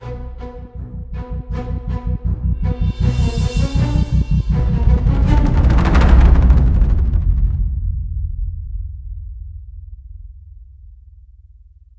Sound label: Action Determination 80bpm Intro